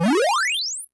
8 Bit Arcade Video Game Start Sound Effect Gun Reload And Jump